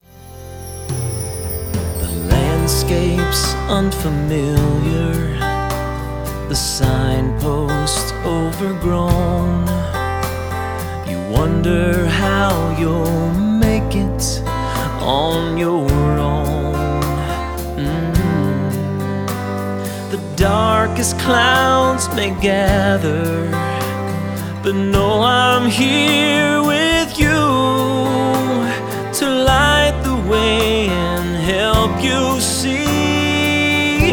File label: Song, C to Db